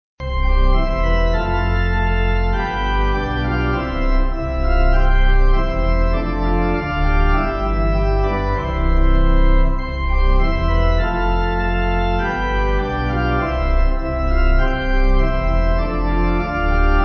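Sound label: We Eat The Plants, Pipe Organ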